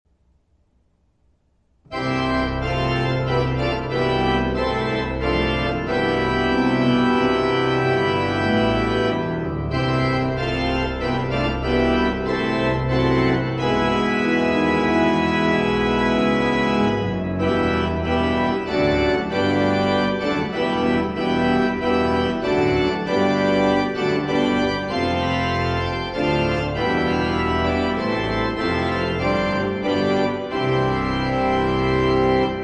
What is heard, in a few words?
Cortege; Gordon Young